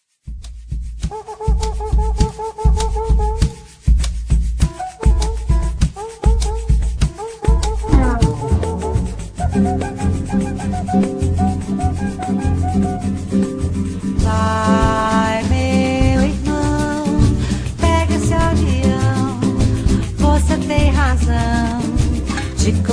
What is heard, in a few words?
samba